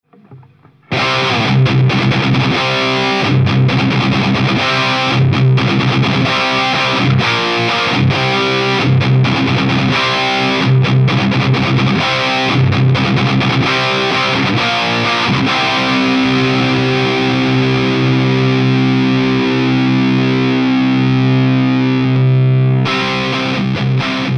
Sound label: FAME T120H OD, guitars